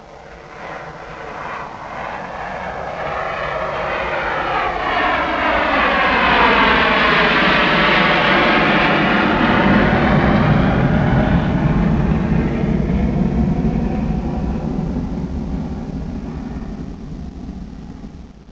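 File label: JET TAKEOFF; EFFECTS; PLANES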